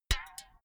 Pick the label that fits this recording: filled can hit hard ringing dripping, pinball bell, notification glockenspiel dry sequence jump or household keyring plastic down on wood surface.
filled can hit hard ringing dripping